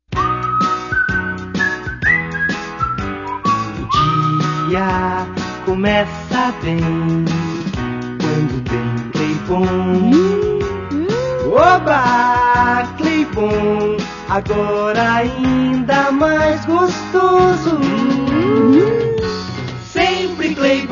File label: jingle; Claybon 80